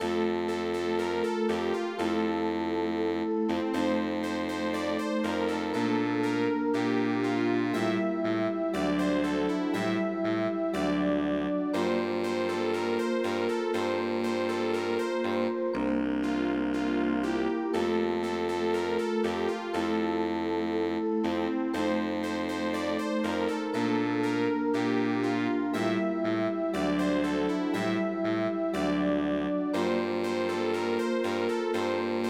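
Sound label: raindrops, midi; raindrps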